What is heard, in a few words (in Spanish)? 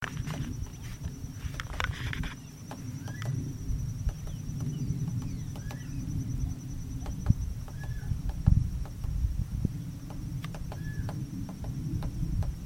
Carpintero Bataraz Chico; Veniliornis mixtus